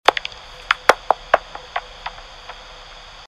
Fledermaus

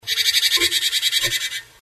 meise elster rufe; nistkasten

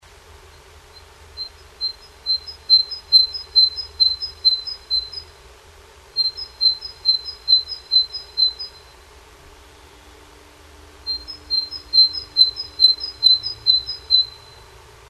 nestbau rufe, nistkasten

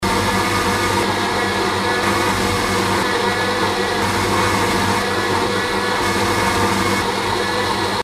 mit Behaelter Vergleich; blendtec 625

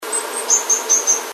meise rufe; nistkasten

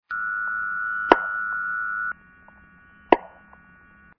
fft dauerpfeifen beispiel

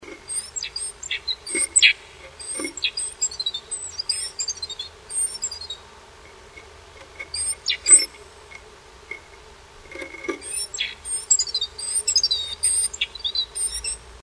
nestbau rufe, nistkasten

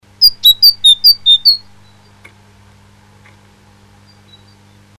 w antwortet m; nistkasten

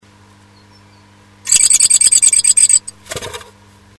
w antwortet m; nistkasten

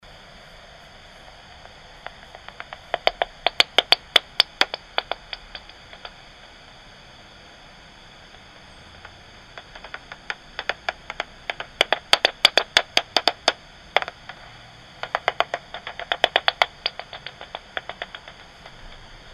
Fledermaus; franzis fledermausdetektor

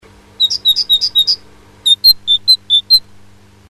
meise, nistkasten

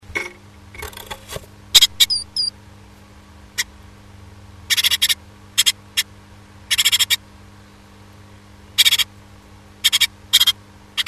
wecken, nistkasten

